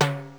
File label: Timbale Low, Yamaha DD-7